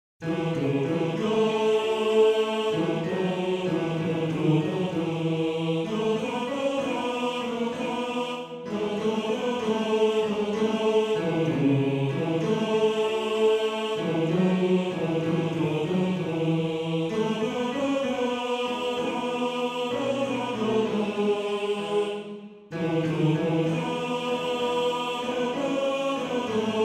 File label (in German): Bass; Bass 1